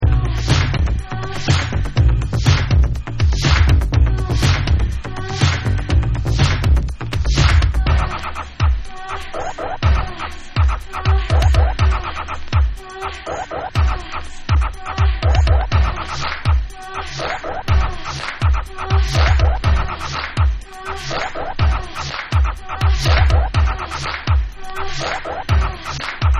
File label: 808 Beats; hip hop crucial 3